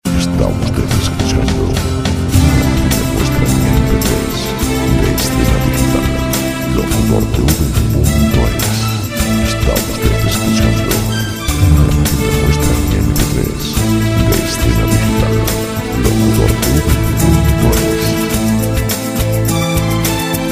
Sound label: rock